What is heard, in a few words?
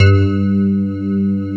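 Rhodes G2, left; East West - Phat & Phunky CD2; 90's Sample CDs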